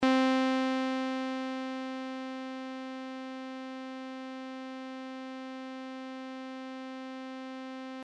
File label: ADSR; synthese sonore les enveloppes